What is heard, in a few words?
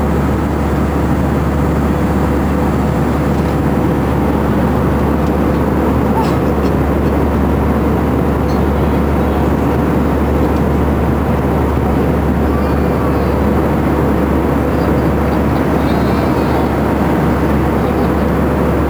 ENV INT com air cabin